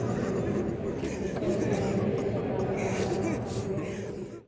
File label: church masses cry